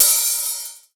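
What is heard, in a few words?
hi-hat open, drums; East West; Global Sounds; RY30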